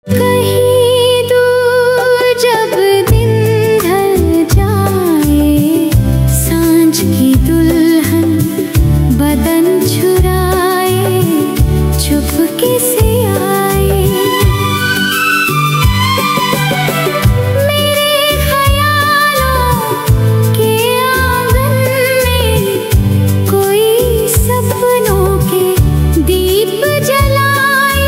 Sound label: AI Female Ringtone